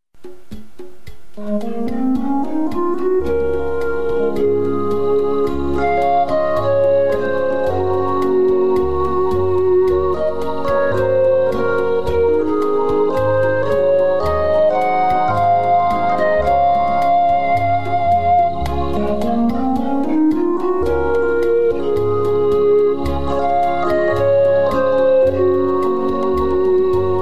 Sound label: Latin Rythms